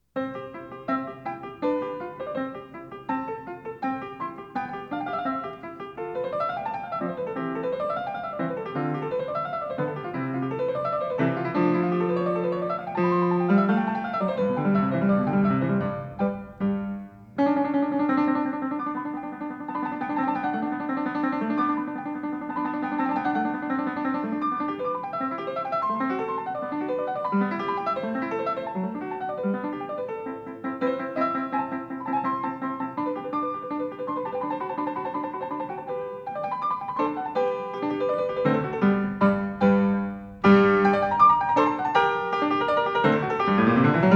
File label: Sonata No. 16 in C Major for Piano K. 545 I. Allegro